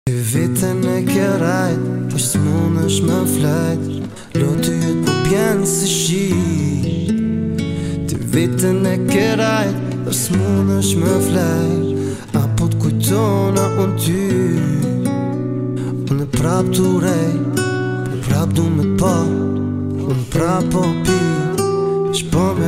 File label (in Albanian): (Acoustic)